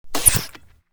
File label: Whoosh, Bow Arrow Shoot, Magical, Surreal, Science Fict; Sound Design